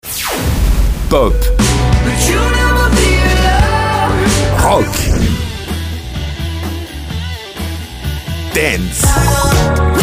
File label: Pop Rock